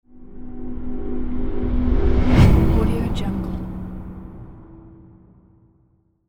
transition movement sound effect

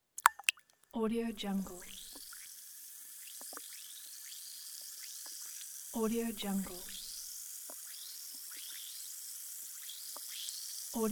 effervescent fizz

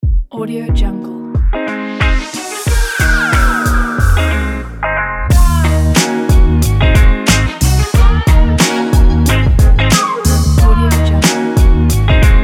hiphop; blockbeatz

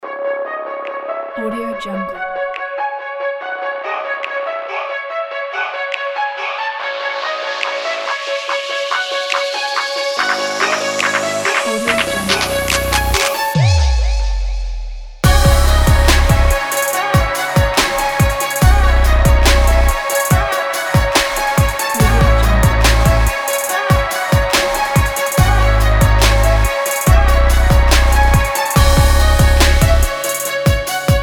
rap kit

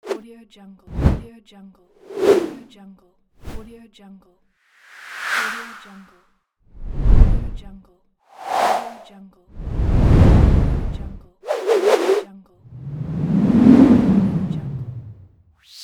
wooshes

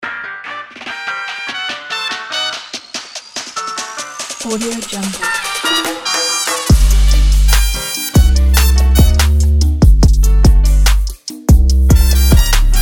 latin trap; 99instrumentals

